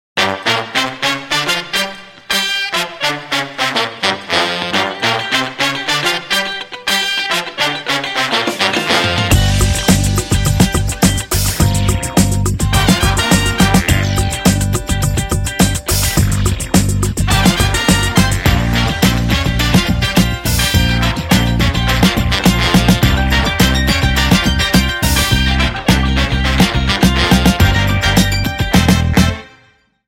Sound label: NEW FUNK